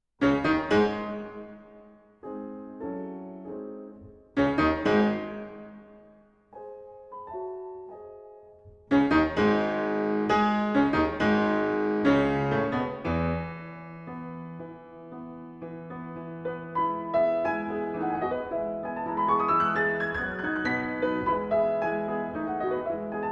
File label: Piano Concerto in E minor Rondo vivace; Chopin; No.1; Op.11